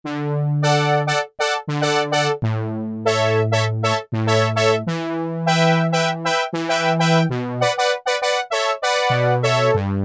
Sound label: Synth Brass, Korg MS2000; Factory Patches